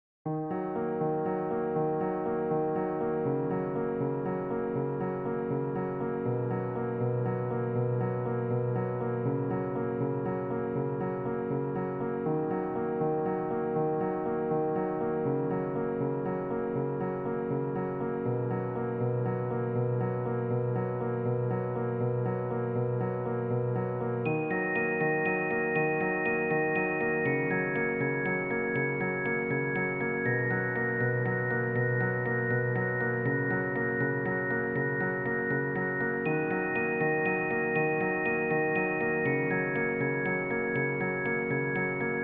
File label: MIDI ditty; spellman studio